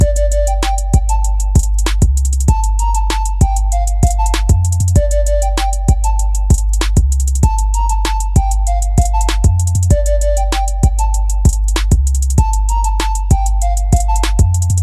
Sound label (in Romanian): fluier